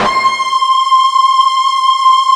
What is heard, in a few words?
FUZZ C 5, Guitar; East West; Steve Stevens Guitar Samples Collection